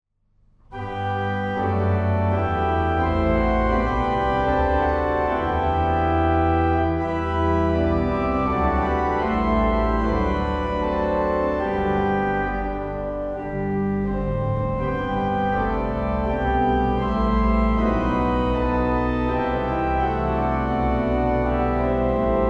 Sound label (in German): Praeludium, orgel; Aulfingen